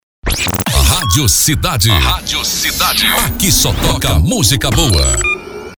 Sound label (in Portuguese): vinheta